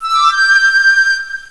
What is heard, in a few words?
Pipe; 22khz; Triazzle 1.0 Demo